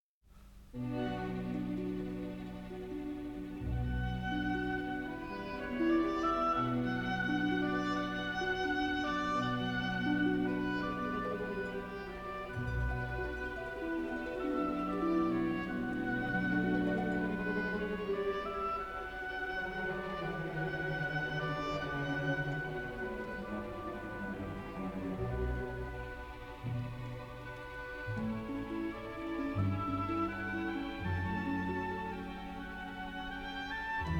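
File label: oboe orchestra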